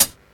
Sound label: raw hip hop pedal hi hat sample D key; one shots; hat samples